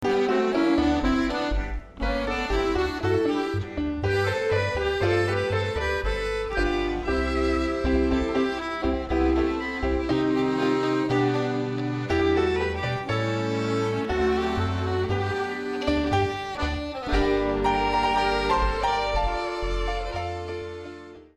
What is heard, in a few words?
Strolling Serenade Tango